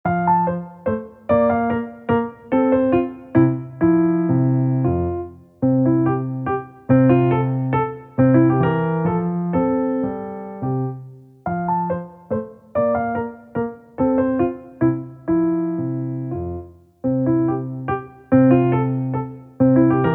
Minuet in F Major, piano; K. 2; Mozart; 20 Piezas Simplificadas para Piano

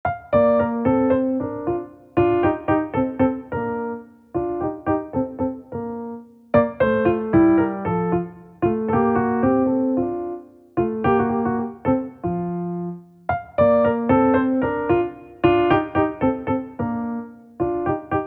Allegro in B flat Major, piano; K. 3; Mozart; 20 Piezas Simplificadas para Piano

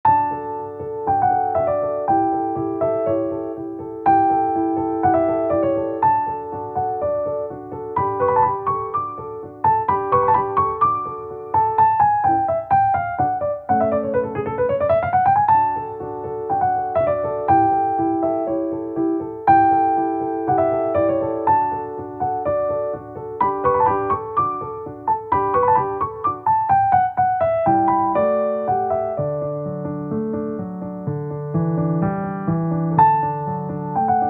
Rondo in D Major, piano; K. 485; Mozart; 20 Piezas Simplificadas para Piano

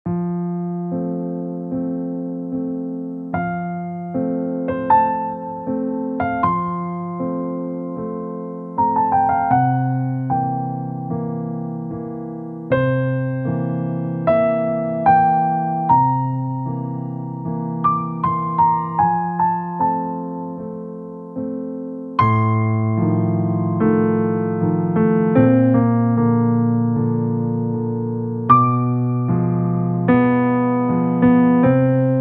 Piano Concerto, piano; Elvira Madigan; No. 21; K. 467; Mozart

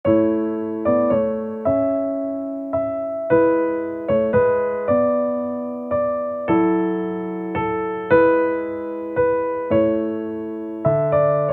Piano Sonata; No. 11; K. 331; Mozart

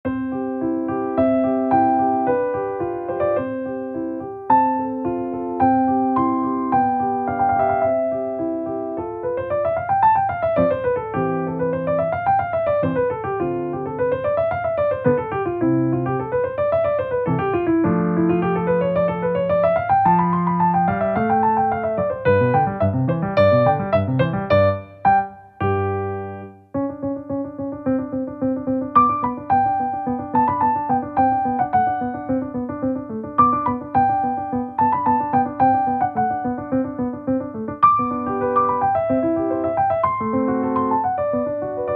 Sonata Facile, piano; No. 16; K. 545; Mozart

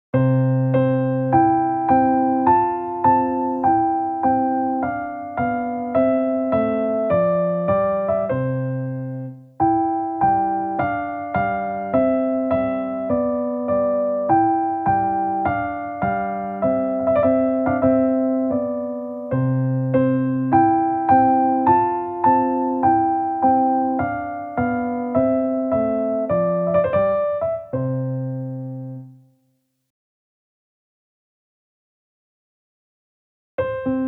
Twinkle Twinkle Little Star Variations, piano; K. 265; Mozart; 20 Piezas Simplificadas para Piano

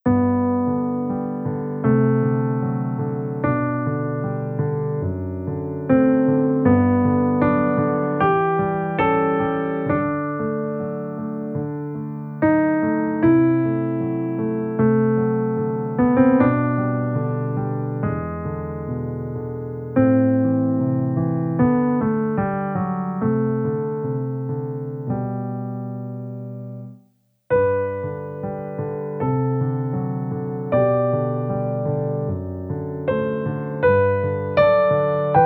Piano Sonata; Beethoven; Pathetique; No. 8; Op. 13; piezas faciles piano

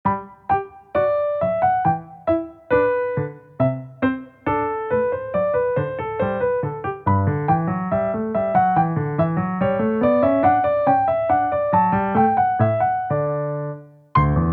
Gavotte, piano; BWV 816; Bach; piezas faciles piano

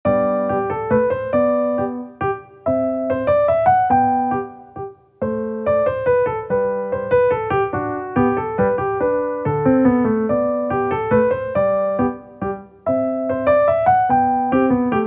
Minuet in G Major, piano; BWV Anh. 114; Bach; piezas faciles piano

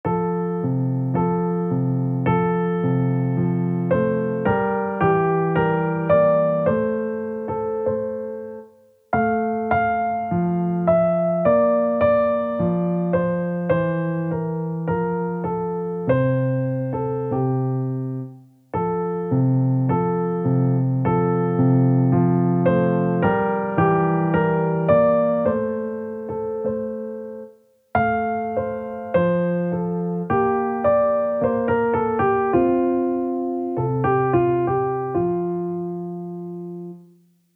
Romance, piano; Eine kleine Nachtmusik; K. 525; Mozart; 20 Piezas Simplificadas para Piano

